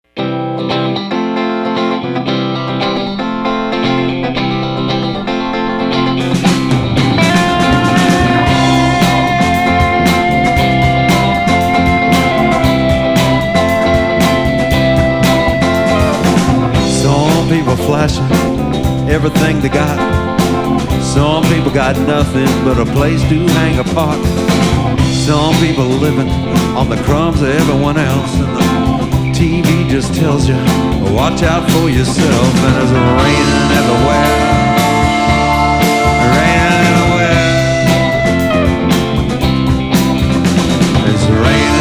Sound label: Live Band; Lazy Brad Lewis